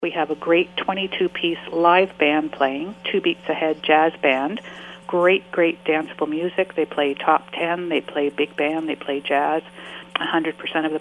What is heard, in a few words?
guide dog jazz